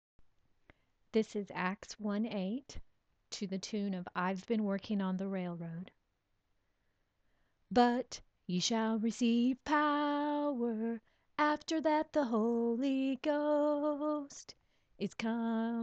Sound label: song